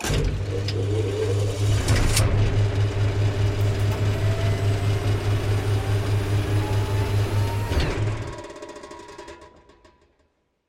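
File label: sounds of time machine or teleport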